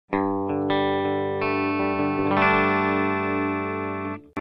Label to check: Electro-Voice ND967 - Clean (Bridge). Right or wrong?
Right